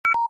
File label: Low Battery